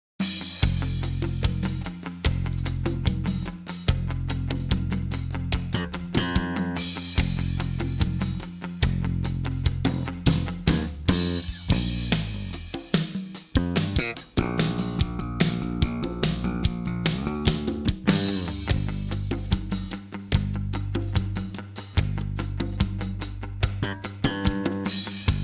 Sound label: rhythm mix